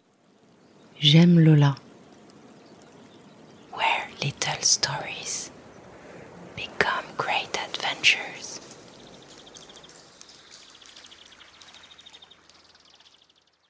Sound Intro Site; JEMLOLA

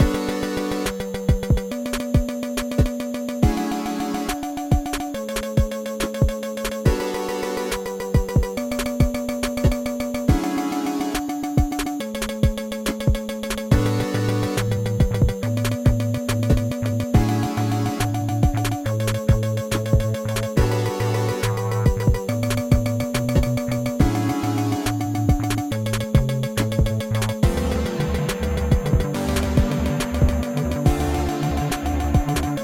Gears Puzzle, game music

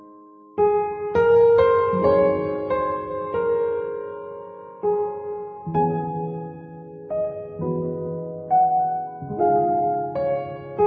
orchestrion roll

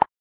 notification sound

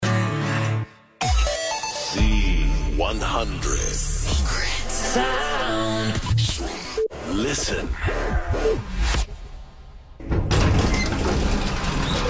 Bit Secret Sound

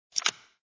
deal a card; human-activity

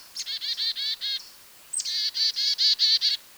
Carolina Chickadee; woods; Ariel Foundation Park

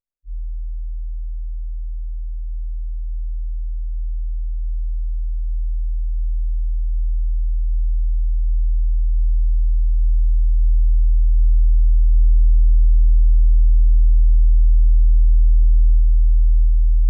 hourglass 40hz

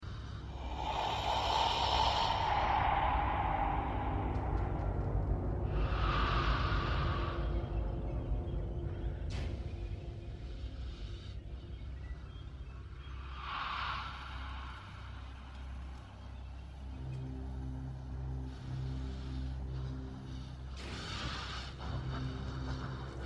alien bg sound

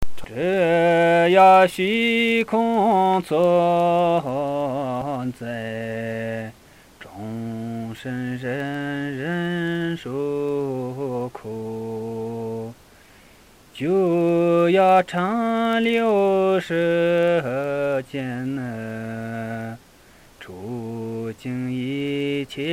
Shantideva Chant in Mandarin

